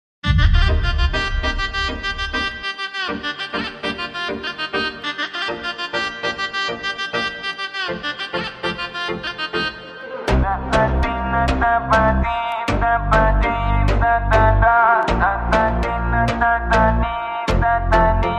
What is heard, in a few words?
BGM